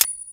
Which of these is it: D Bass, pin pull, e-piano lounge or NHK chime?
pin pull